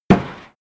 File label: impact dull metal damped; soundpool